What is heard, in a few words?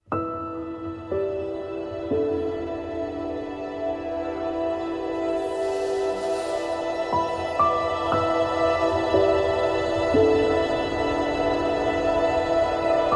Zen Ambient